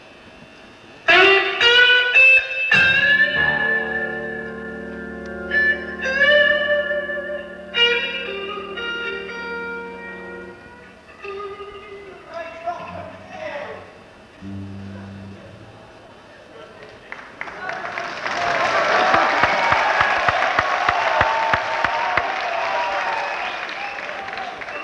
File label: Blues